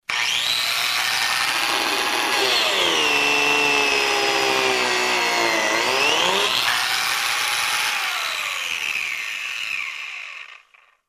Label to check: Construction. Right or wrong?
Right